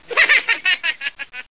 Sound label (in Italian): Risatina; Omnia Junior - Base Terra